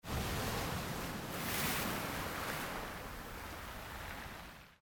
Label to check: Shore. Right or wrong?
Right